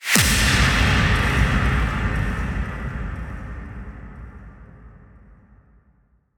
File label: Impact